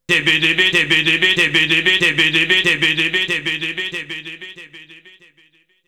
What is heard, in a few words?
Vocal; ZERO G - Datafile DISC 2